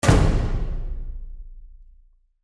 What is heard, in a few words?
orch hit